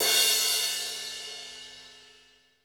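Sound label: room cymbal crash; Crypton; DrumBase5000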